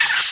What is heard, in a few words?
spider; Undercroft; enemy